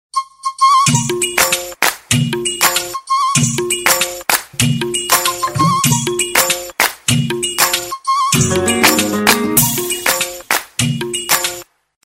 dj tabla ringtone

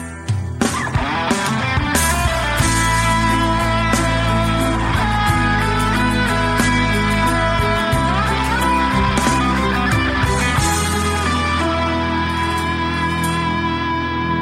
awesome love guitar music tone ringtone

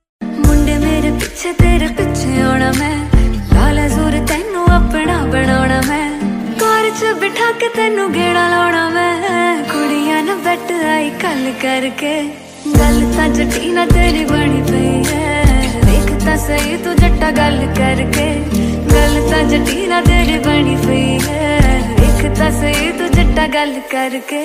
song love hindi ringtone; bollywood ringtones

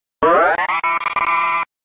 alarming ringtone; galaxy ringtones

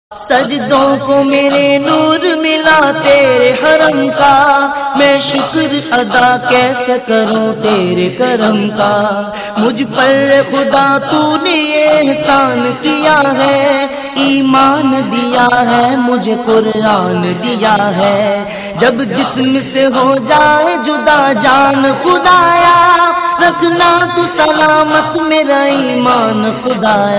islamic prayer ringtone; islamic ringtones

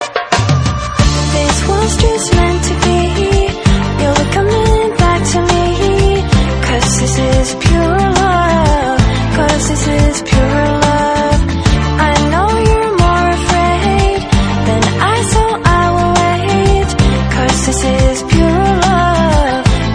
love english song ringtone

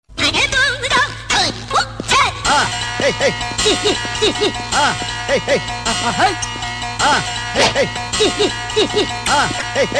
comedy ringtone, funny ringtones

